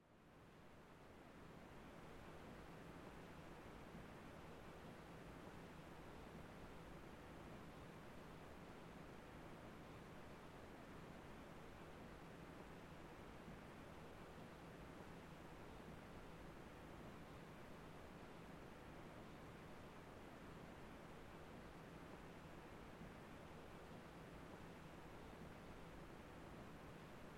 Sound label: PC Operating Noise Far Room Noise